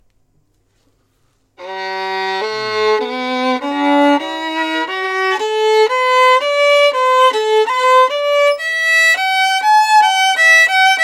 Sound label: arioso new bass barr tonica strings